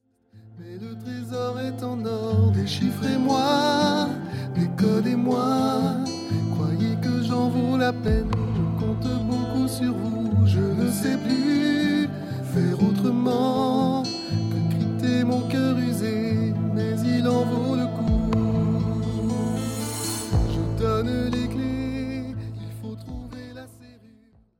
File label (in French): voix lead